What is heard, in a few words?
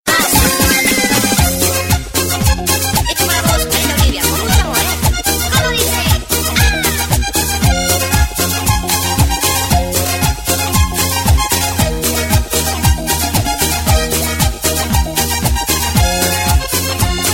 Cumbia